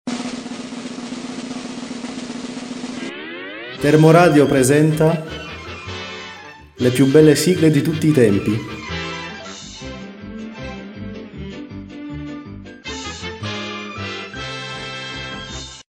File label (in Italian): sigle, jingle